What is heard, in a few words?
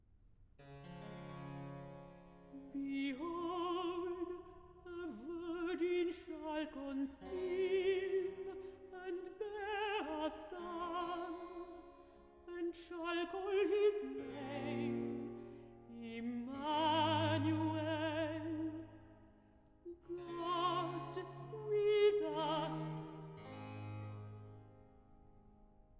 Recitative; Behold a Virgin shall conceive; HANDEL; MESSIAH